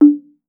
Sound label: TONGUE DR; TONGUE DRUM